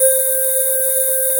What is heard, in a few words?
VOCAL 130BPM; SONIC ELEMNT; SONIC EL YES